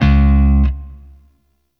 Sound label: Sentient Shawzin Oct Two C Sharp